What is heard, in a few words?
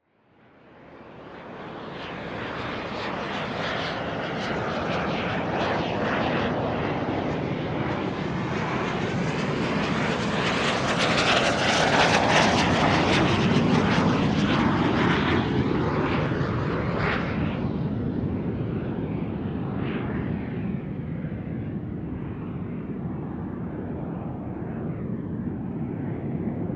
Jet, Fighter, CT-114, Tutor, Squad, Medium Distant, Climb, Starburst, Big, Slow; Airborne Sound; Attack Aircraft Maneuvers; Snowbird